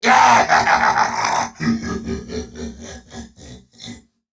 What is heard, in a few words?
Laugh; Warframe; Grineer Chem Nox; Battle Barks